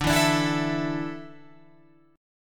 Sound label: D Suspended 2nd D down Guitar Open F; Dsus2; strummed